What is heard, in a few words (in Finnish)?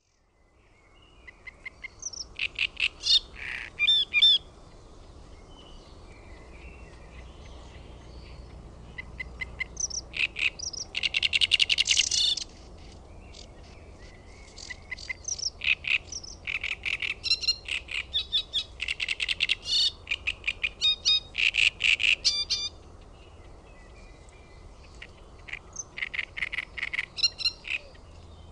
RASTASKERTTUNEN, LINTUAANITYKSET